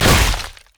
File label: basic attacks; LoL; vi